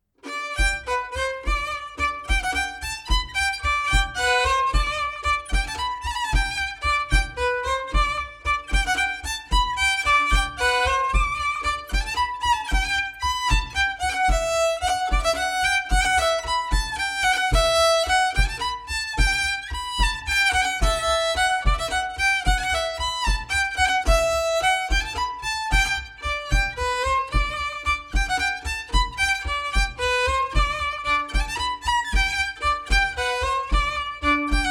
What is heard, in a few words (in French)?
Bourree d Auvergne; Bourrees et autres danses antiques publiees par Elie Dupeyrat